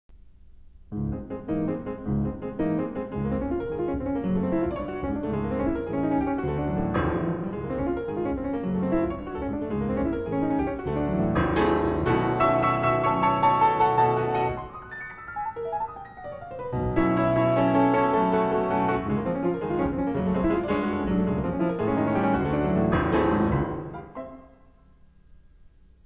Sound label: Hurried Waltz